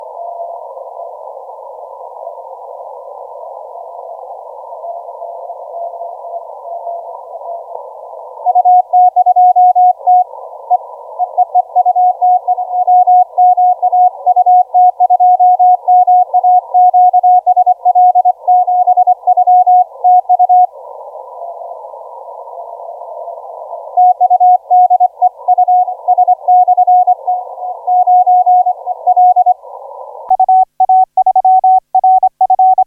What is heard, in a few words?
17m CW; FS